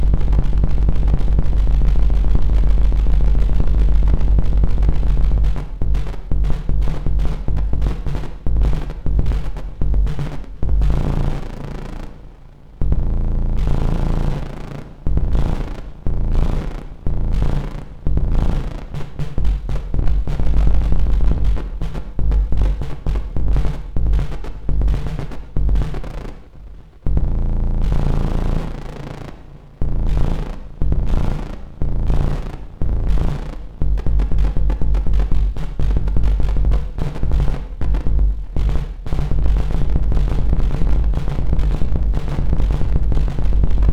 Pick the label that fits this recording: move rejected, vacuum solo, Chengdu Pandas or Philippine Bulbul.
vacuum solo